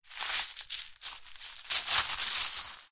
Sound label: foley footsteps barefoot scuffs movements small stones shingle